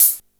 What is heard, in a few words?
closed hat, Yamaha RY-8